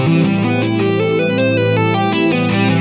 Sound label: sweep picking